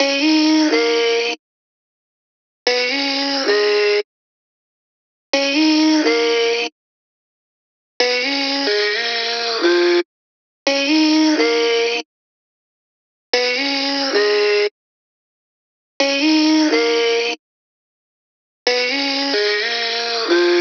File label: vocal chop, 90, Ebm; FeelIt; Slate Digital Omnivox Vocal Sample Pack; Chops